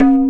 Perc, Percussionz

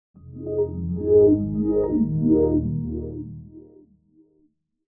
in Windows XP

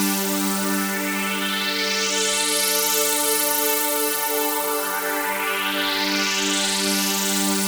Swirl Pad

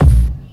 Kick

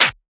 Percussion; FLPRO

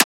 Transient; FLPRO

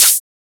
Open Hat; FLPRO

